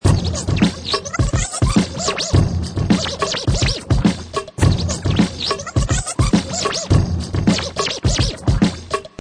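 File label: Scratch Crazy 100 beat, Professional killer vinyl scratch on top of hip hop beat; Scratch Crazy 105BT; Dj Sound Effects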